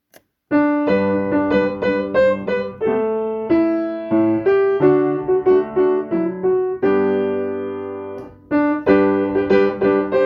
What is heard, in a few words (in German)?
Klavier